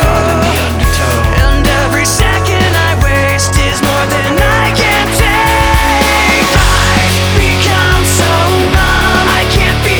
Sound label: rock